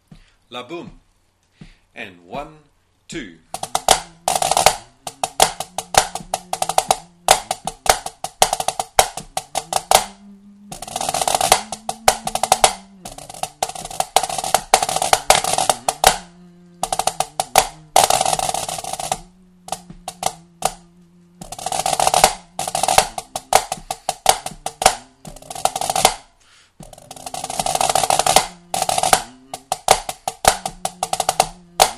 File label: snare